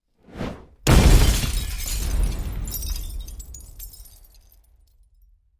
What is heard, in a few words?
Molotov cocktail, explosion; explosions